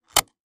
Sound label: gas cap; gas caps